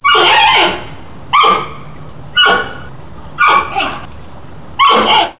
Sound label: Ailuropoda melanoleuca; voice